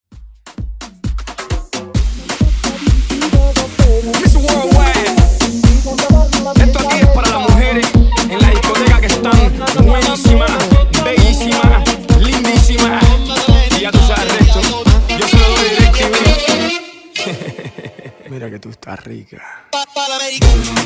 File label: Afrobeat Redrum; remixplanet